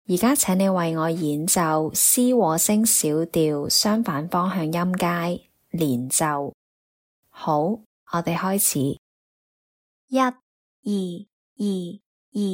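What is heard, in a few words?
C minor harmonic contrary motion scale legato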